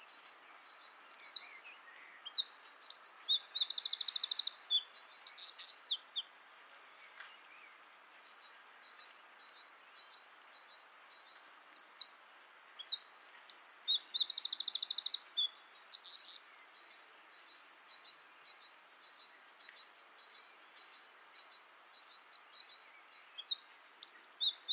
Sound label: Vogelstimme Zaunkoenig, Eurasian Wren, Troglodytes troglodytes; Vogelstimmen